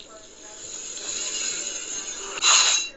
claws to blade; wolverine

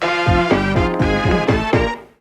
stinger swedish version

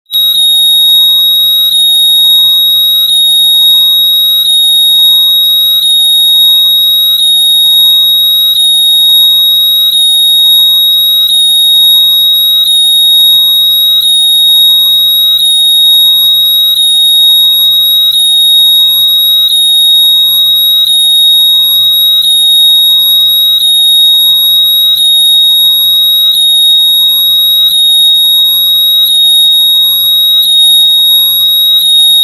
mega sound vine boom; loud high hz earrape

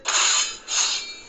kill retract; wolverine

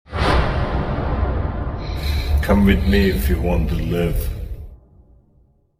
follower alert

